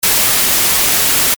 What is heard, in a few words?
noise; nord